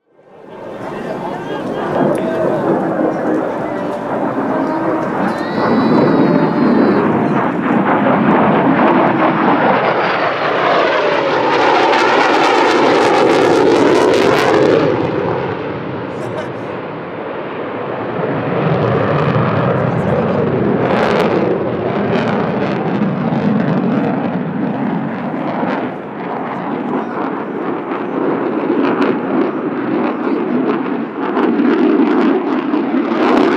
aircraft sound and effects

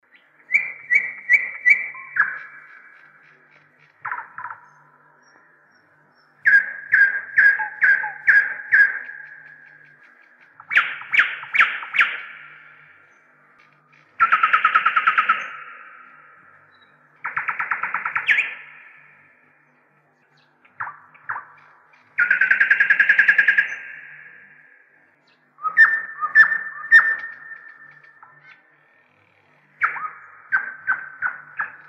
nightingale sound

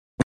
fart sound

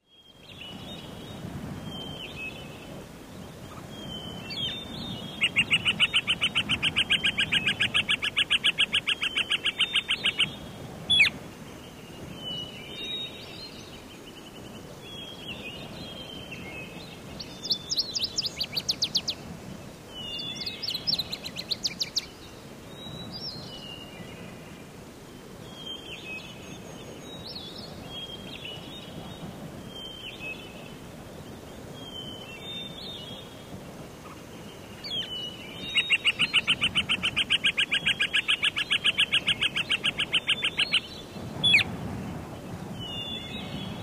sound forest

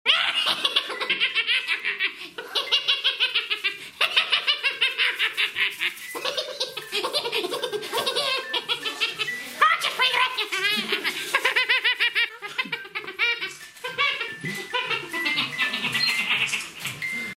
sound baby laughter

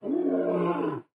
lion sound

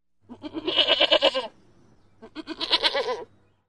goat sound

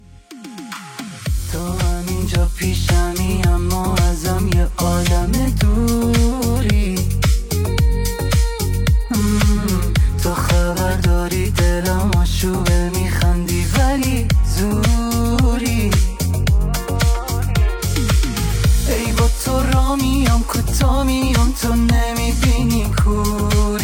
Solo Taar

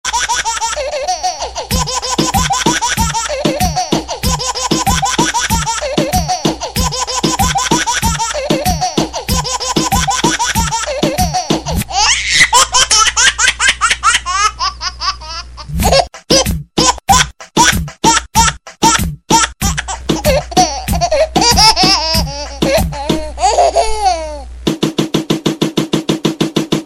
sound baby laughter

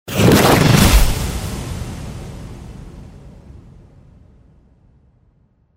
wolf sound

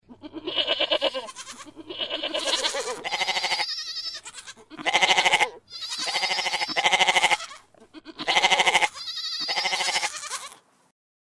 goat sound

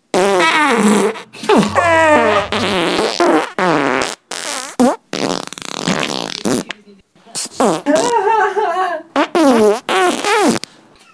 fart sound

